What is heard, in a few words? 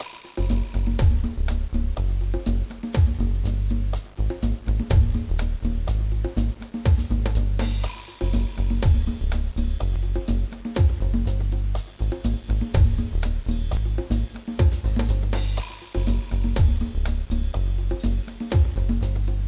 Waterfall